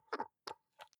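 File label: soap dispenser press almost empty soft, bathroom; haus-garten; badezimmer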